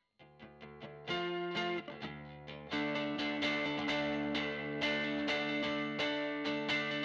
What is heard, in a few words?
GTR Royer